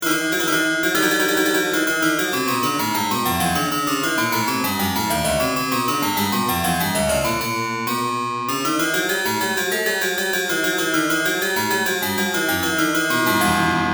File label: Harpsi Mock; Improved Mock Harpsichord; simulations; nemus numerical sound restoration